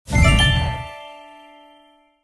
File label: Win; Temple Run Treasure Hunters; android